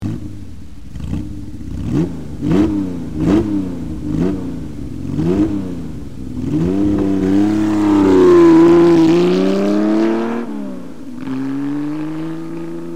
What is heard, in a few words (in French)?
904, Porsche, moteurs; (1964); Démarrage